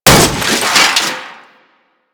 Container Alloy Plate Open; Objects; Material Specific Loot Containers; DE; Warframe; Storage Container

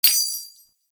Step Spur, Foley, Footsteps; Enemies; Warframe; Duviri; Dax

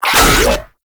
Infested Shotgun Low Clip; Weapons

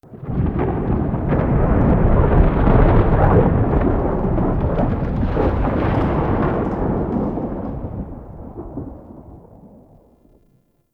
Murmur Exterior Rolling Thunder H; Warframe; Ambience; Duviri

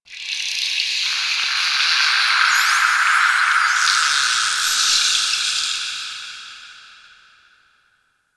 Ocean Dolphin High; Ambience; Warframe; Grineer Ocean

